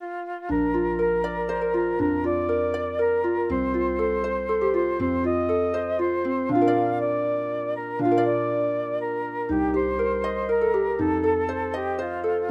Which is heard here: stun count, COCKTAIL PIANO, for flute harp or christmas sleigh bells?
for flute harp